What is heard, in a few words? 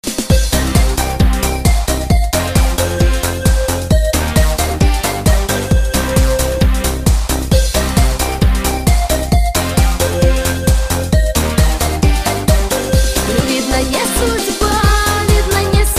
russian song